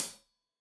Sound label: Hat Tight, Hi-Hat, Cymbals; Deeply Sampled Drums